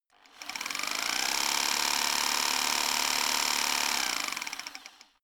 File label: rock drill chisel fast; maschinen; schrauben drehen hydraulik